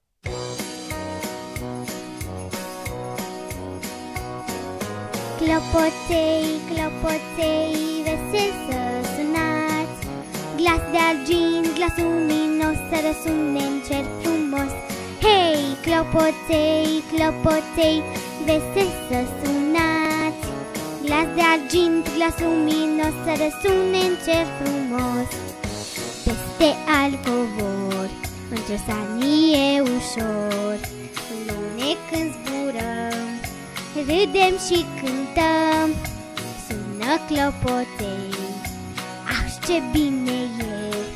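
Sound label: in Romanian language; jingle bells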